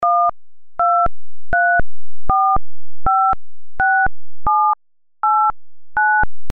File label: DTMF